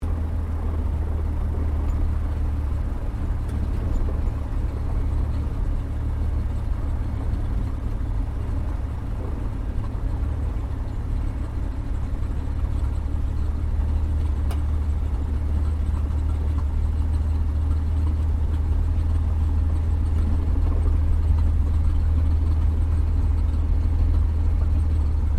AIRCRAFT CESSNA O-1 BIRDDOG TAXI SHUTDOWN INTERIOR, spotter aircraft; Airplanes